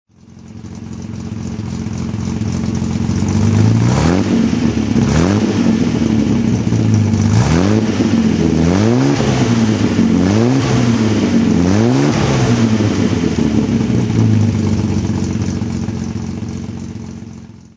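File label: healey3000 mk2 throttle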